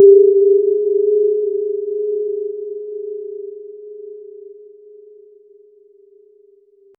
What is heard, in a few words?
satisfying ting